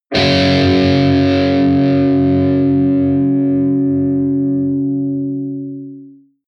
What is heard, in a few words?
electric guitar distorted powerchord low sustained